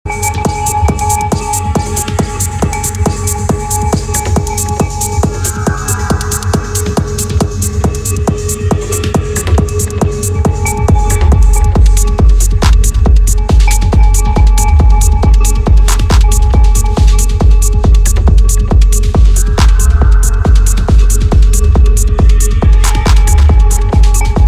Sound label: Chimes